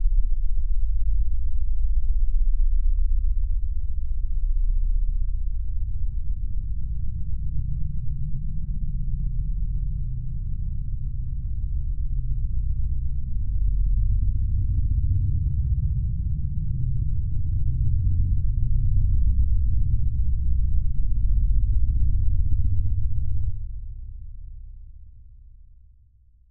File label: Drone; Drones